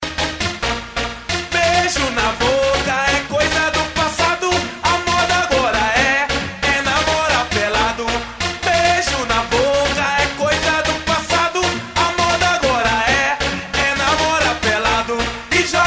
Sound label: Axe Bahia